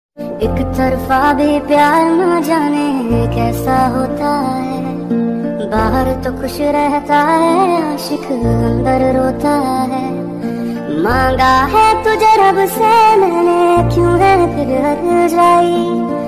sweet ringtone of flute

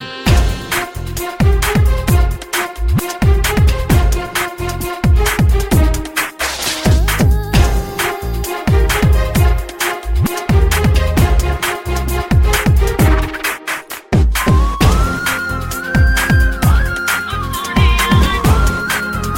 hindi song instrumental ringtone

